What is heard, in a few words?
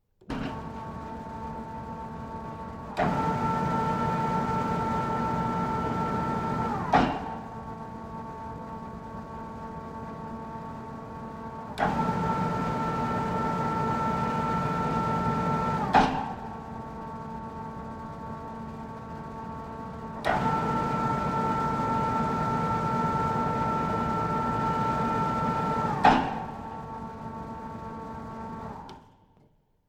Industrial Mechanical Large Conveyor Varying Speed Start Run Stop; Conveyor Belt